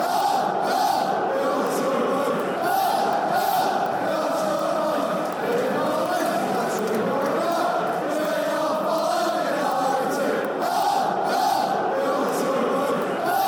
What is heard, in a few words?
hello hello fan chants; sufc